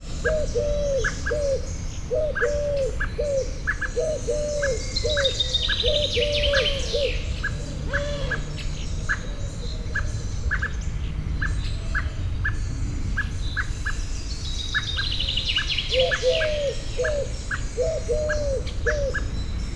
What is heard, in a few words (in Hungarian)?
balkáni gerle fészkéért aggódó fekete rigó hím erdei pinty éneke; szegedi zoo 2011